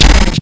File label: jump; quake2; cobra